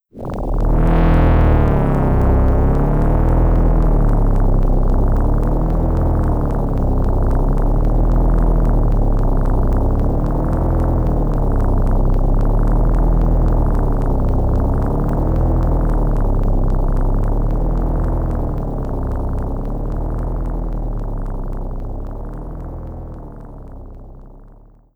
Arcade Version Sound Test; Alien Syndrome

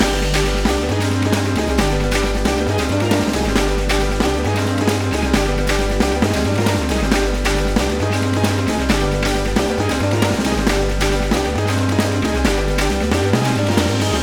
stun loop main

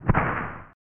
Game Over Thud; Pitfall The Mayan Adventure; SNES

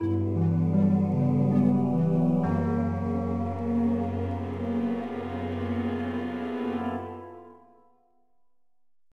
Death; marvel super hero squad the infinity gauntlet 2010 nds gamerip; Sting Death

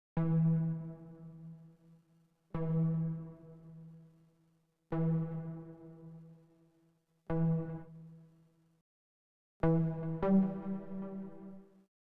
Roaming Melody

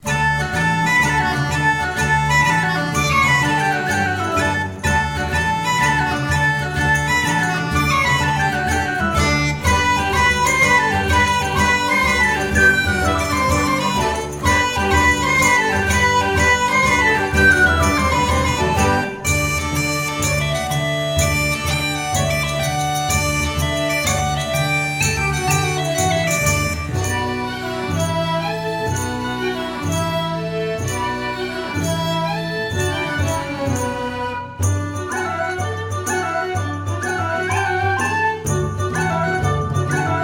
Jig; Nancy Drew: The Haunting of Castle Malloy

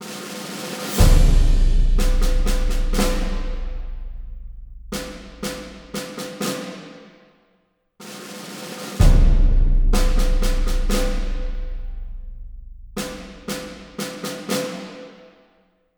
Music Start Screen

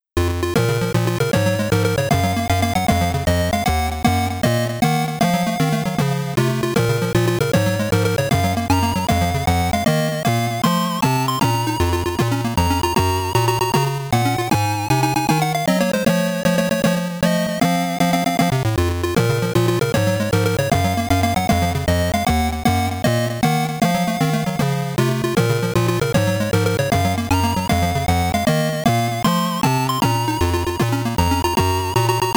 BGM (PSG); New City Hero

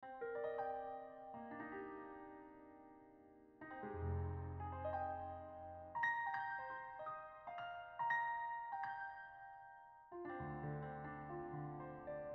menu piano

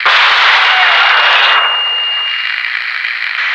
death

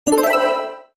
rally skill sfx; Animation Throwdown

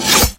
hammer hit

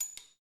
9mm Hit; Red Hot Vengeance

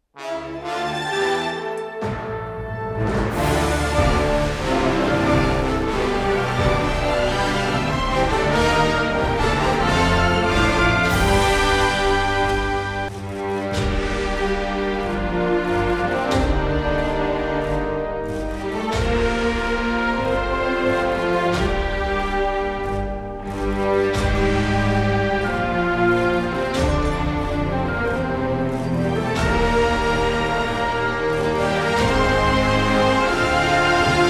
BGM others result, boss; Babylon's Fall

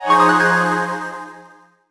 Win; MGGardenGnome; Dark Dimensions City of Fog

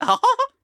Jump; Collector

